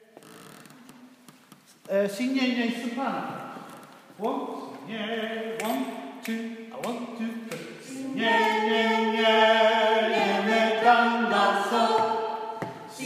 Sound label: Soprano